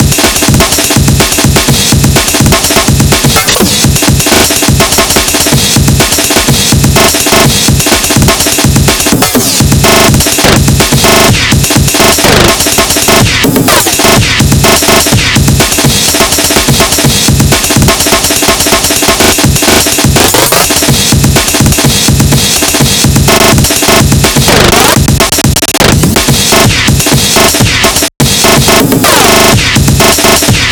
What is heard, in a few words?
250 breaks